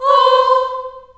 C4 CHILD OHS, Choir

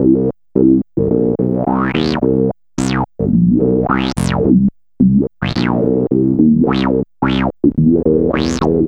E-Bass + Analog Synth

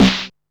snare, techno 138 BPM

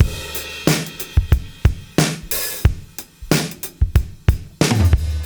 091, Beat Loops; Zero-G; Monster Beats

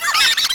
Laughers; SNES; Spawn

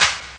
hall clap; ST-XX Amiga Soundtracker Sample Packs

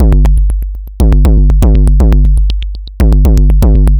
Anabeat Mix, Beats

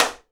SIDE STICK, Live Snares

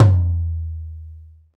TOM LO, Roland; Club-50 - Foundations Roland; Naturals A

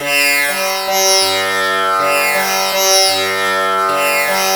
TAMPURAS; Spectrasonics; Heart of Asia; INDIAN SET

